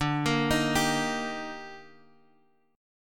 D sharp Major D, chord; D#/D arpeggiated; JGuitar

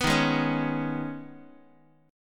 D sharp 7th Suspended 4th Sharp 5th, Guitar; D#7sus4#5 strummed; JGuitar

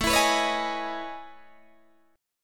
A Major Sharp 11th, Guitar DADGAD

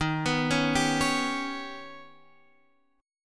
Eb Augmented 7th Eb down; Eb+7 arpeggiated; JGuitar

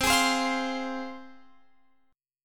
C Suspended 4th Sharp 5th C, Guitar Open F; Csus4#5 strummed; x,19,20,19,20,19

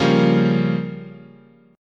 C sharp 6th Flat 5th, guitar chord

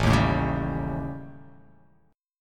A 7th Flat 5th; 5,6,7,6,7,x,x,x

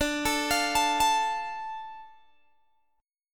D Minor D Guitar Open G

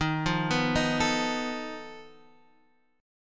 F 7th Flat 5th D sharp

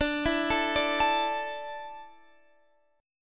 D Suspended 2nd D down; Dsus2; arpeggiated

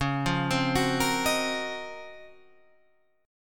Db Major 13th, Guitar Half Step Down

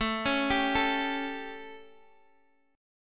A 7th A, Guitar Half Step Down

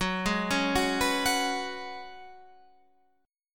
Db 7th Suspended 4th Gb, guitar

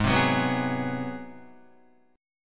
G sharp 7th Sharp 9th, Guitar; strummed; JGuitar

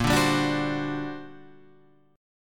G Minor Major 11th, Bb

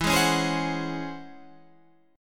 C sharp Minor Major 11th E, chord

